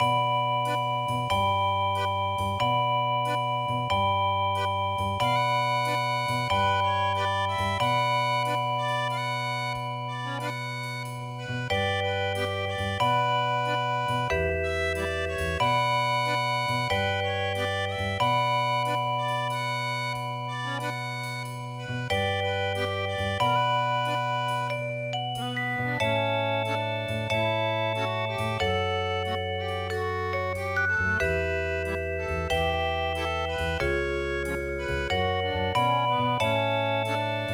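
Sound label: swan lake musette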